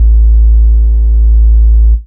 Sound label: sub A, techno trance bass